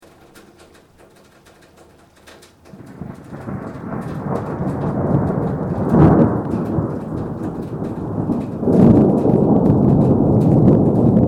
THUNDER LONG CRACK RAIN LIGHT

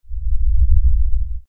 Lfe Swell Low Bass Movement; Swells; Blastwave; Sound Effects:Sound Design

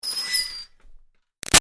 Screech Metal Iron Board Short Light; Ironing Board; Materials

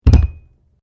Weights Dumbell Put Down; Gym weight lifting equipment; Sports

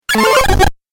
Retro Glitch; Glitch Sound Effects; sound design

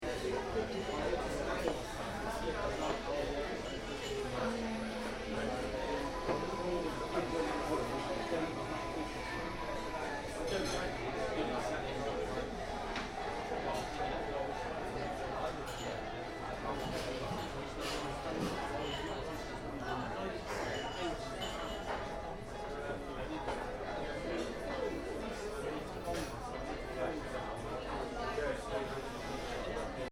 Bar, Interior: Ambience, Talking; Bars and Clubs; Backgrounds; Stuart Duffield

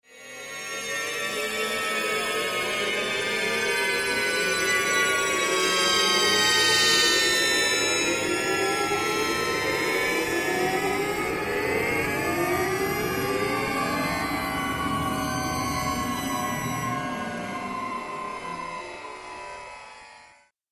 Ufo Engines Slow Descent; Spacecraft Sound Effects

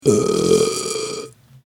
Gross Comedy Burp; Burping Sound Effects; human sound effect

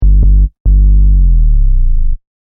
Techno-Trance Sub Bass Loop In G 142 BPM

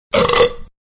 Burp Throw Up; Burping; Human; Manhattan Production Music

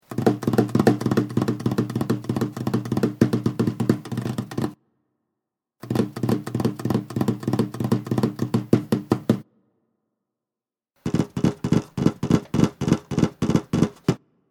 Impatient drumming of fingers; Tapping; Human

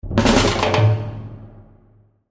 Percussion Snare Bass Jumble; Cartoon; Cartoon Music; Cartoons; Blastwave

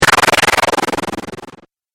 Game App Explosion, Video Game Sound Effects; Twistedtracks

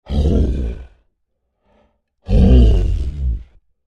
Creature Large Moan Angry; Monsters; Sound Effects:Horror; Big Room Sound

